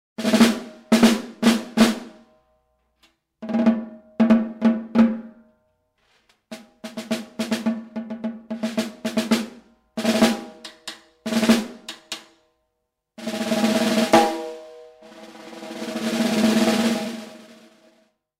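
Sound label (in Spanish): Caja, Percusión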